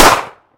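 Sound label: short single pistol shot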